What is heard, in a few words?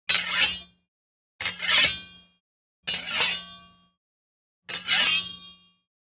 Knightly sword blade scrape